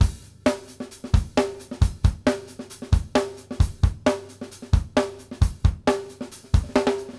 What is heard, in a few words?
BASIC B R, Drumworks